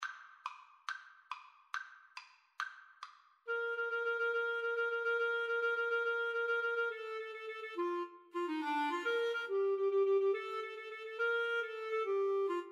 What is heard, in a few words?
Clarinet Duet